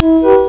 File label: SYS CHECK